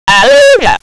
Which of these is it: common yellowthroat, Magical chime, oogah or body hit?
oogah